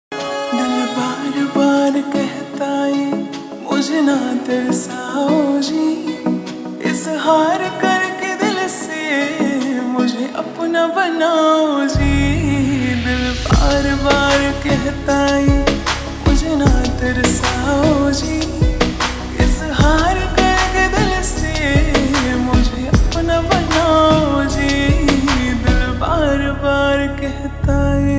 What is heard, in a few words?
Slowed Reverb Ringtone